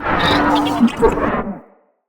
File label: Moa Investigate, Vocals